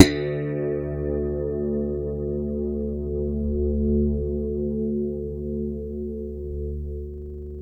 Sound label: Warwick bass D2; Masterbits CLIMAX 7